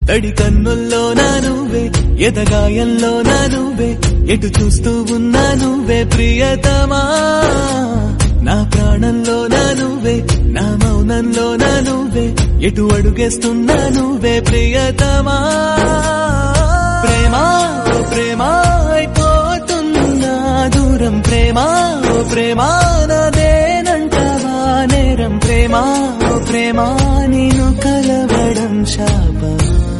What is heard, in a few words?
love songs telugu